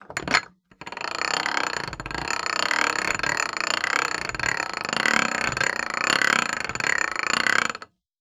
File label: inner cogwheel turning medium downwards fast, Metal Gears Cast Iron; NTG2 + C417; Sound Design Tiedemann